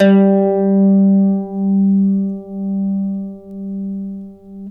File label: g+2, Mandolin; Terkelsen